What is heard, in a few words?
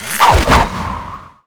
cable whirl